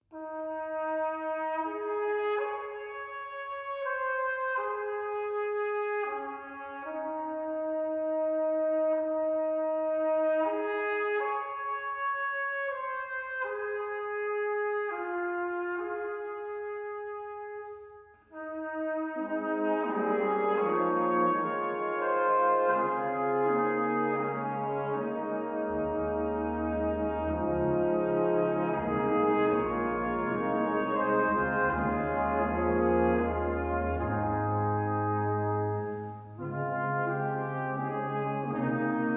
Brass Quintet Lullaby

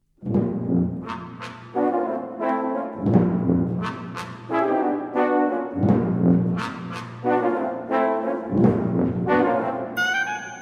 Brass Band Scherzo

